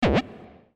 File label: button